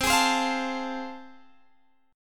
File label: A Minor Major 7th Sharp 5th, C